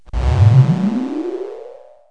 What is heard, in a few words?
Spaceship Liftoff; KABOOM! - Wild and Wacky Sound Software; Spaceships